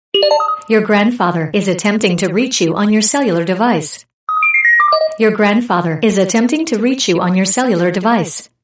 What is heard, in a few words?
grandfather clock